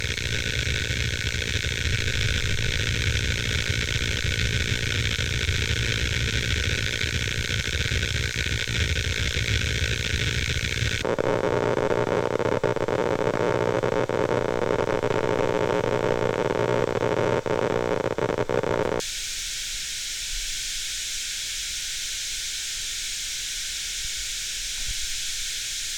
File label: OPA4228 Noise